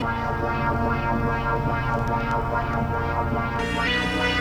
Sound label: Ambient; Sonic Foundry; Sony Creative Software; Stylus Pressure Urban Grooves on Digital Wax; Nu Tang